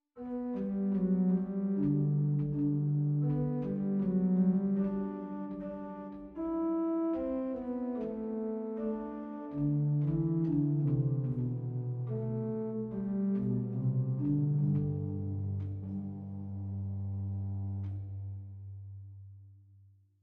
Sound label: Pedaal Octaav 8; zwolle